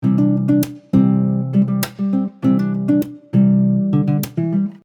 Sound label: Percussive Cmaj 100bpm, Soft Nylon Guitar; Percussive Patterns; MG Soft Nylon Guitar - MIDI Pack